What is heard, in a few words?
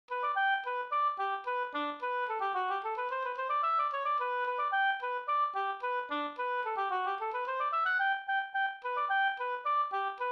polka